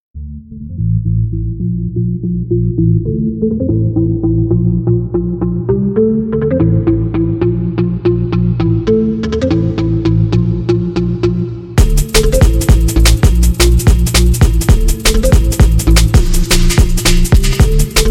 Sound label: 90s dance fits to jungle